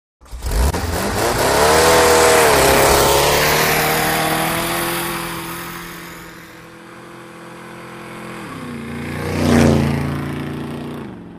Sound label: Speeding Car